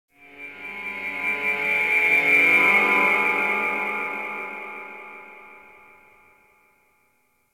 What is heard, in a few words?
Space Exterior Dist Call, Ambience, Space Battles